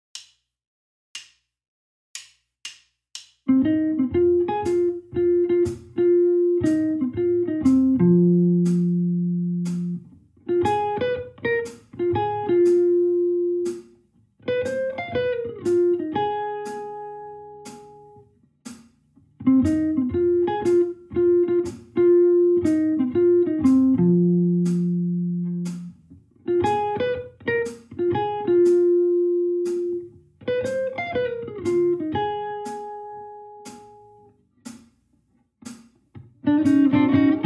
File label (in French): 2 guitares guitare 1